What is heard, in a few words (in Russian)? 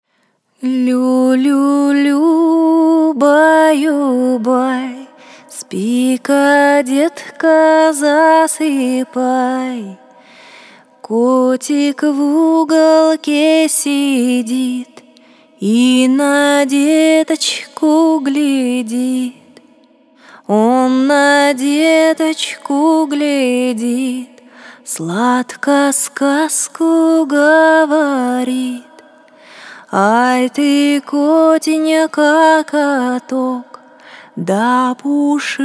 Русские народные колыбельные, Котик